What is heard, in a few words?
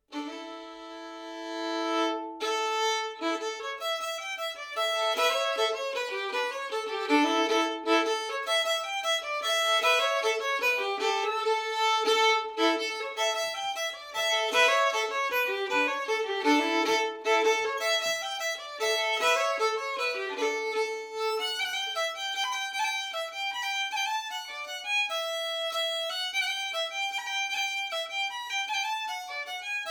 fast; Akkar